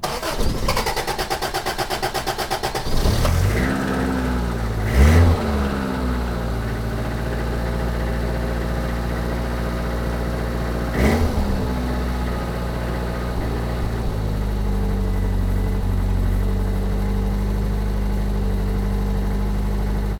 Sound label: car motor on; best service Blue Box